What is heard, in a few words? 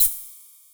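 cymbal, Vermona DRM2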